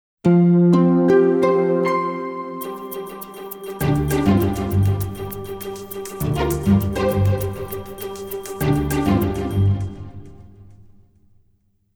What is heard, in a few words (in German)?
Klingelton